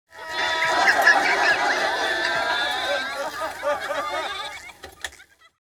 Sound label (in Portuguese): Faniquito Comico, Risadas Individuais